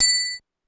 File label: Bell, Pitched Up, Clavia DDrum